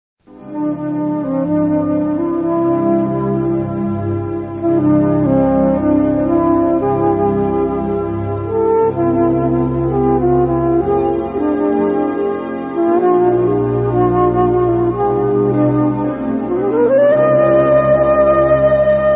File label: duo guitar flute